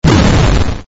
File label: sq bomb; 90k-games; fliflixx